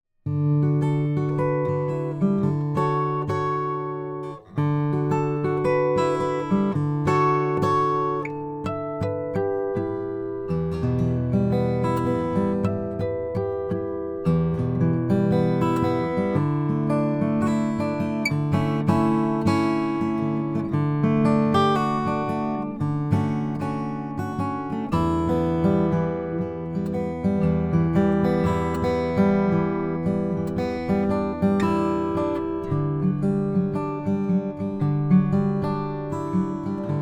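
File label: froggy bottom f12c deluxe guatemalan rosewood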